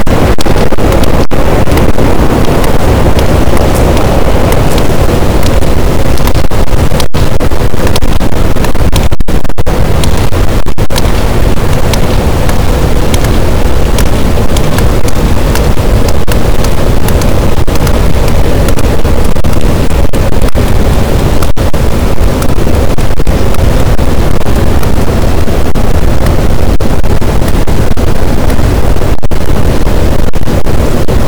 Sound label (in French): cachalot positif, boat, Physeter Macrocephalus